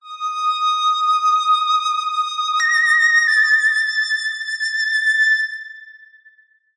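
science fiction 50s style synthesized accent aliens weird theremin; zapsplat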